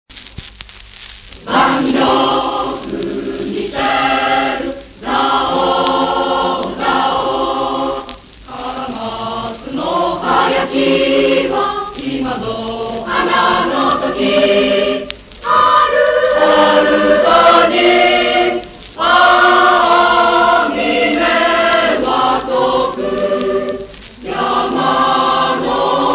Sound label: chorus